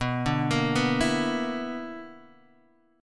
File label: B-Minor Major 7th Double Flat 5th; BmM7bb5 arpeggiated; JGuitar